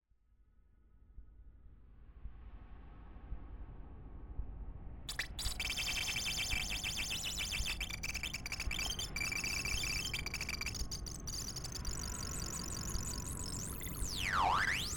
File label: Digital Glitches